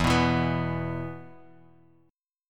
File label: E 5th, Bass Standard; strummed; JGuitar